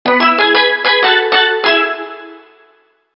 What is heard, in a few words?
Reggae